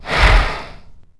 Sniff, Dino

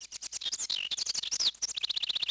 bird; Ambient; MX vs ATV Unleashed

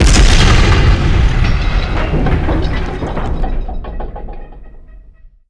die; Harbinger; Star Wars Clone Wars; XBOX

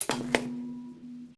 Electric On; Max Payne

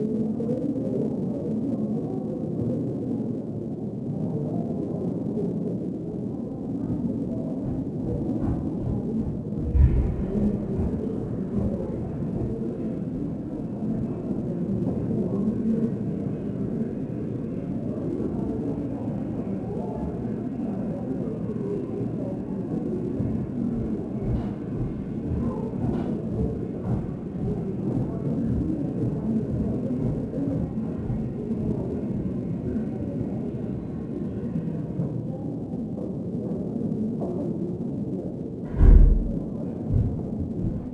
Old Building, noise; Max Payne; XBOX

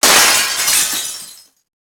Break Glass Medium; breakable

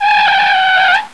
skid; Totaled; XBOX

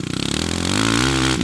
Low, Engine

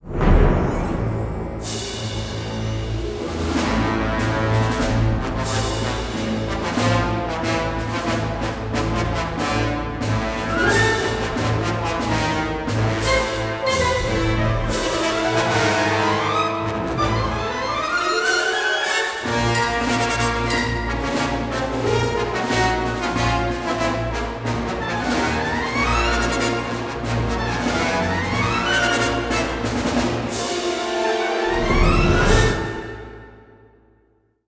sighting, music flourish

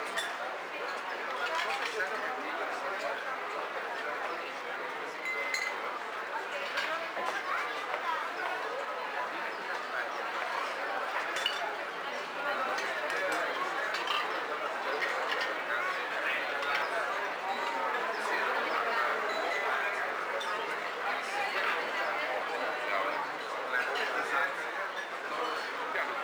cafe crowd; ambience; Project Gotham Racing 2

